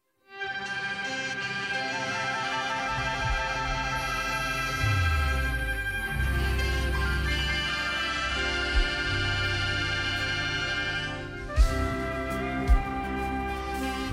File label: INSTRUMENTAL, orchestra; healing streams 13